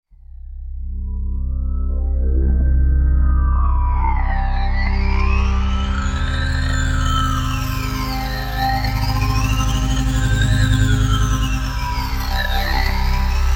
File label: Grainy Metal, V-Synth